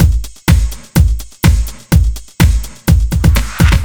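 No percussion, Drum Loops 125bpm, Nu Dance Electro; Zero-G; Soundclash Nu Dance Electro; Acidized Wavs; Heavens Above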